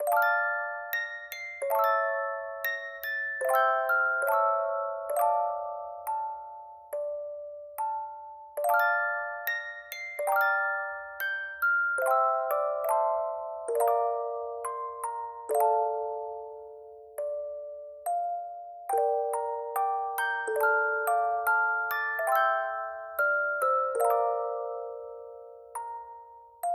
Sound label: Loop Music Box; the walking dead michonne